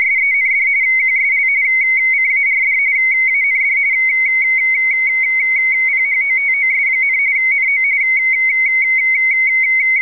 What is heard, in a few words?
rtty